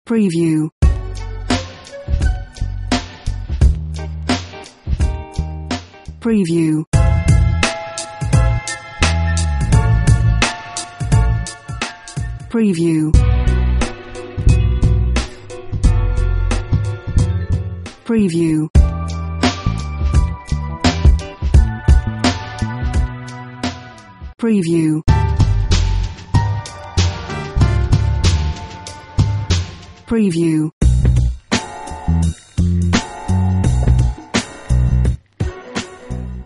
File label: hip hop; producer pack